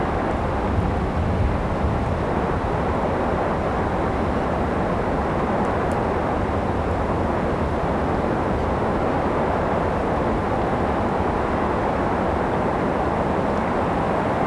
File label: Wind Background Loop; Environment; BackgroundLoops